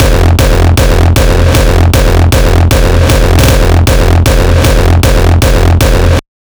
kicks loop 155; GhostHack

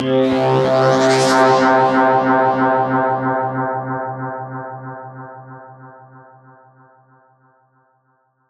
Sweep 140 C BPM, Psytrance Filter Sweeps; Zaps & Filter Sweeps; Darma; Ghosthack; Psytrance Visions Volume 2

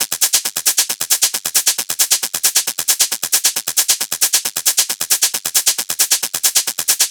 Hat Loop 135, Techno

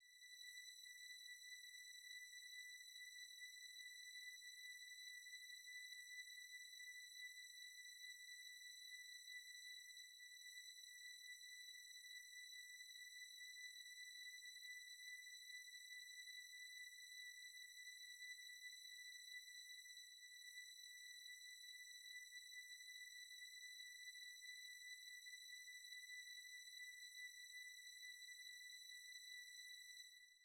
96 BPM B Min Strings, West Coast; Cymatics; GHOST (Beta Pack); Forever

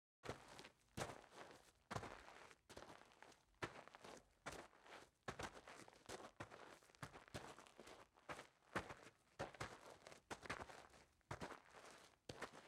Footsteps Dirt; Fairlight Sound Lib

